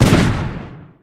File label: fire